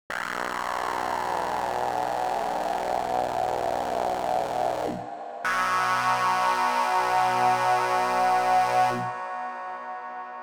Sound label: SuperPlate MS 20 Clean Mix50 Decay 16s; Stocktronics